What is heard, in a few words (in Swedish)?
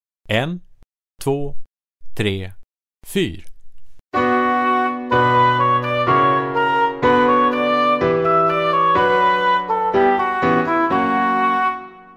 Rytm; Övningsprov